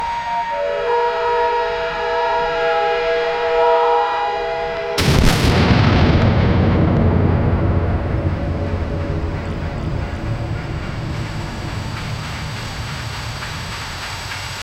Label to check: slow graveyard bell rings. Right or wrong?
Right